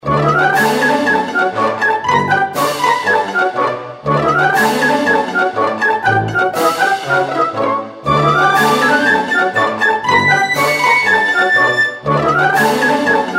outro; Pinocchio

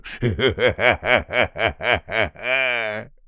voice evil laugh; Sheriff Of Nottingham

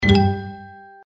bonus; Stocked Bar

